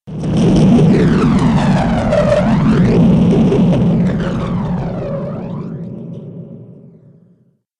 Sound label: Scifi Afterburner, spacecraft